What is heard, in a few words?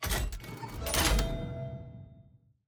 action chest open